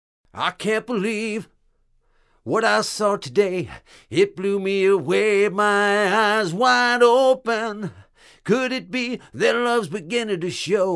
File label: Vocalist Far